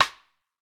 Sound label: Vintage Snare Drum